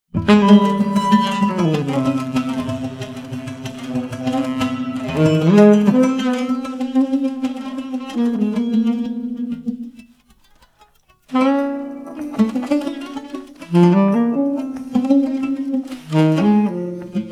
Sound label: Demo Music; Bertom Phantom Center 2; stereo to LCR upmixer